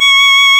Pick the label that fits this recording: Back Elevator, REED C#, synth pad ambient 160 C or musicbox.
REED C#